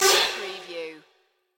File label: Hit Warble Fast Reverb